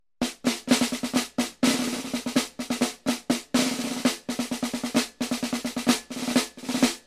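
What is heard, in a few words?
drums military march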